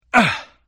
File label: fall; spock